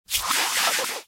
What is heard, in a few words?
Slide